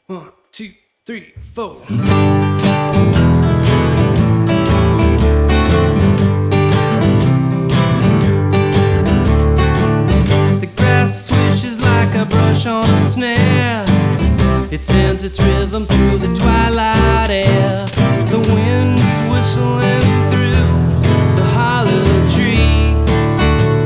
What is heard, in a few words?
Forest Melody